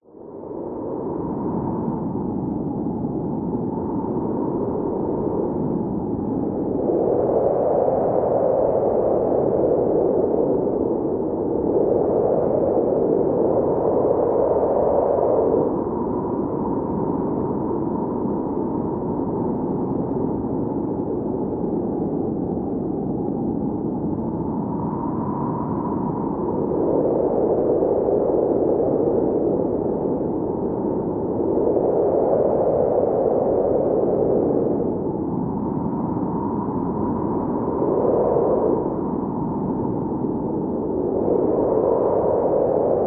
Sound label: nature wind cold icy gusty howling storm; zapsplat